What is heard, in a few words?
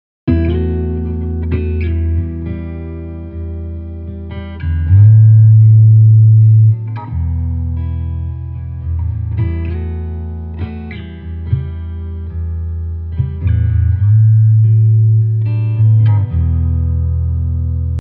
Transition